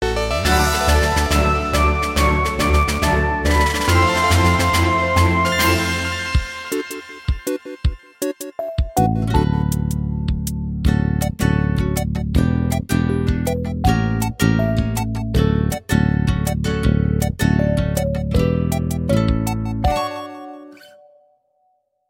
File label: Fanfare; Steven Universe